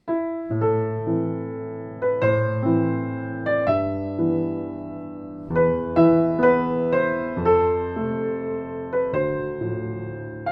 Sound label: Mazurka